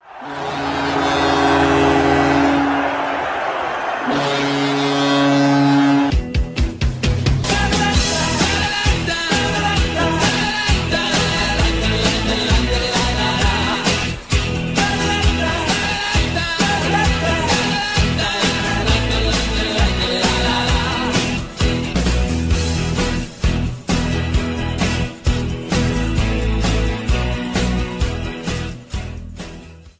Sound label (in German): klingelton torhymne nebelhorn; sv werder bremen